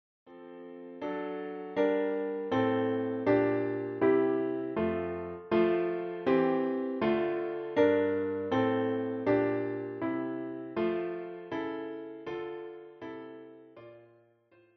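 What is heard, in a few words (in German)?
Der Mond ist aufgegangen Klavier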